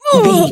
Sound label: voice female hurt pain